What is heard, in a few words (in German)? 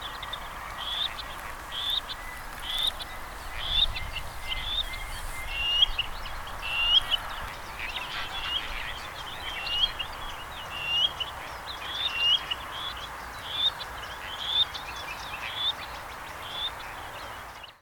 schwarzhalstaucher